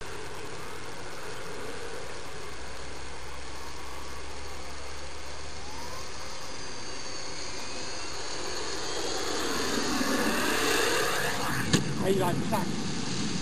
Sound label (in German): Flug Landung